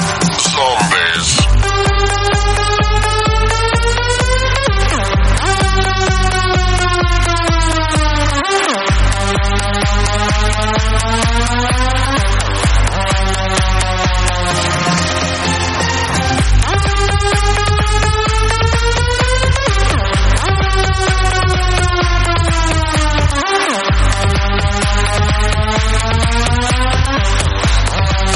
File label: Zombies EDM; dzwonki na telefon